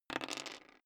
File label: dice roll table